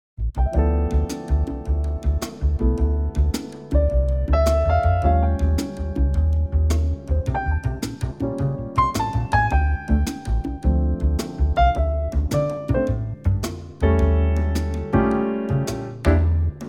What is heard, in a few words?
Dm swing